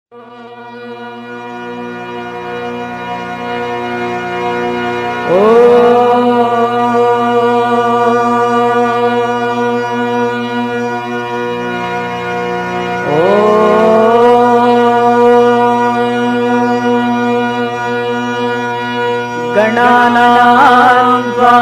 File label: Vedam Chant, Bhajans; Retreat September 2006; Friday PM